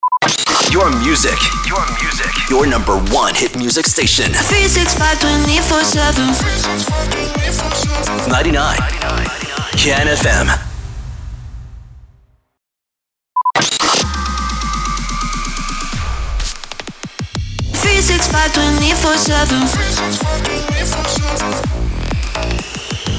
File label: SWEEPER HIT MUSIC